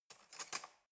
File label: cutlery knife drawer grab soft